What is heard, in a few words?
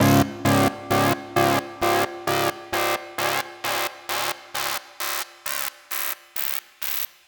Crunch Seq; Dr. Fink's Funk Factory